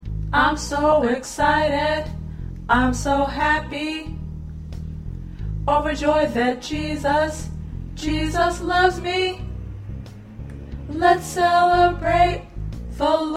Tenor

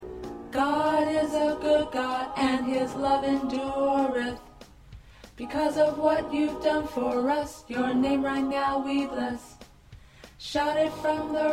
Tenor

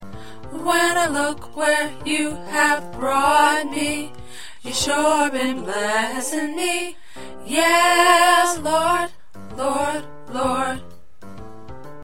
Tenor, choir parts

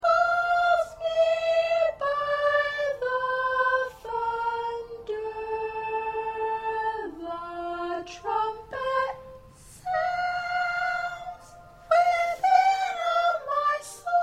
Soprano 1

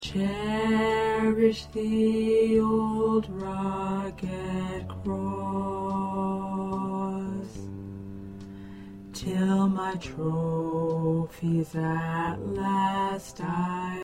Chorus Tenor

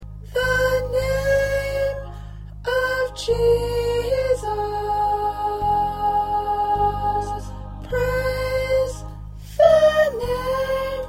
1st Soprano

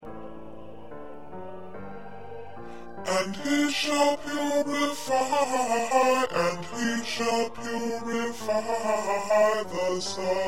Bass

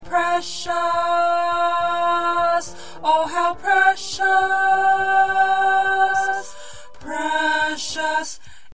Tenor

